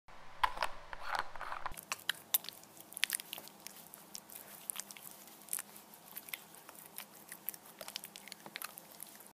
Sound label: hand cream; کرم دست